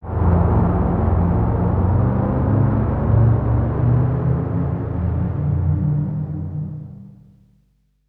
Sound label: SPACE SHIPS; SpaceSounds